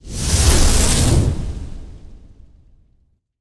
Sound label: Column Fire; Legend of Keepers; Skell Magus Column Fire